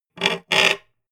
scratch

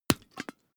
hot-water bag punch hard

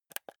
console controller joystick press

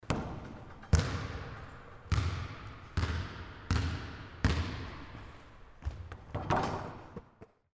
dribble lay up

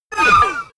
sci-fi laser shot

